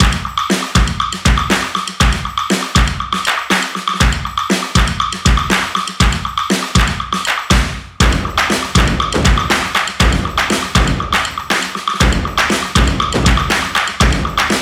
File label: Stomp Dance; Unity Of Noise